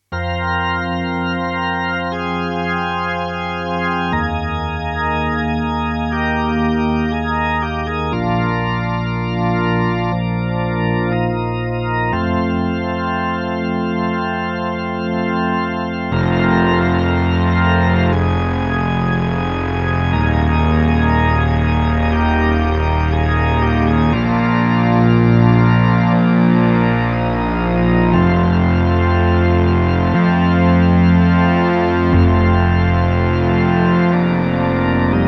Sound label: ditty